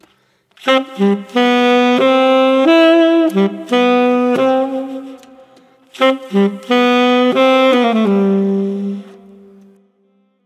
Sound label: Alt Sax; Сэмпл саксофона (Легкая музыка): Alt Sax A